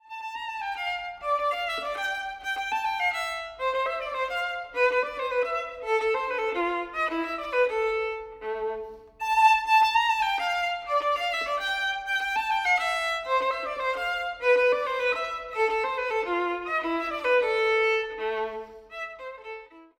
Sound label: VIOLIN; Trinity College London violin exams for 2016-2019; Paganini: Theme and Variation from Caprice no. 24